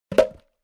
Forestry Big Hollow Wood On Wood Logs Impact Single